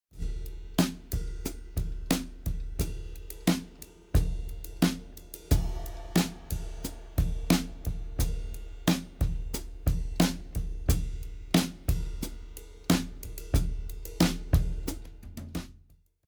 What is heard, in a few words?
drums distance